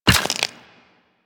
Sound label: Walk Bone, Footsteps; Warframe; Operator